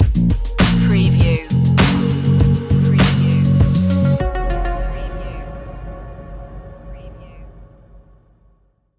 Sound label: tropical bounce stinger